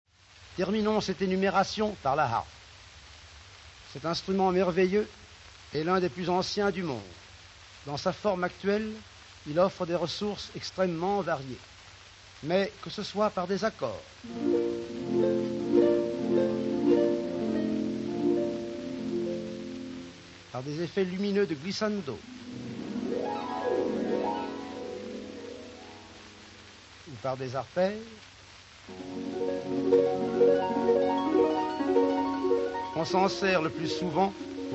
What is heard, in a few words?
orch harpe